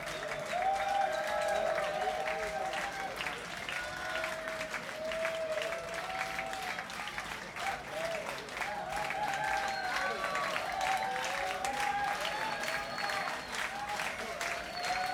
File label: Crowd; Naka-ku, Nagoya, Japan; Club Quattro